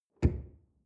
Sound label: drawer large close lock